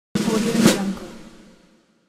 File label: drumroll short